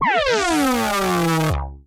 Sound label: Crossfire Lazer